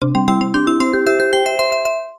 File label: win; Hot Fruits 20